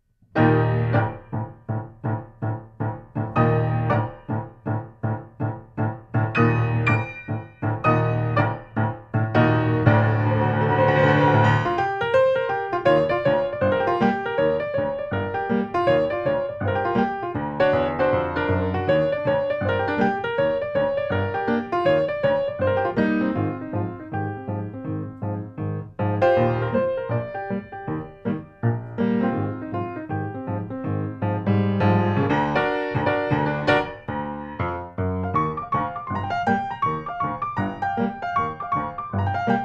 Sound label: piano